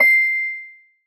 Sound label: soft hit whistle; osu!